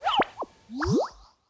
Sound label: Gacha Normal; blue archive